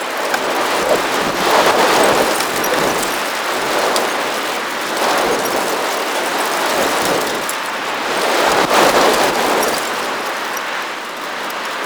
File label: Forest Fan Dust Loop; Warframe; Grineer